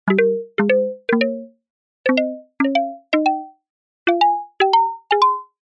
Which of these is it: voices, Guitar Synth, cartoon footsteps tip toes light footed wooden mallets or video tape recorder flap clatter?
cartoon footsteps tip toes light footed wooden mallets